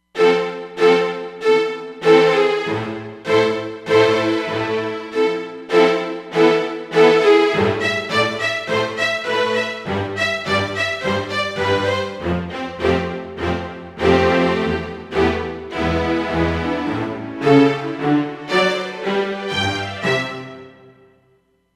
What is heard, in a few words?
Str Section, Roland Fantom XR